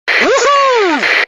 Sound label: Woohoo!